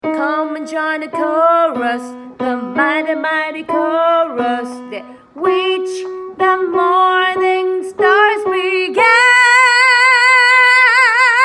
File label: Joyful Tenor; F Voice; christmas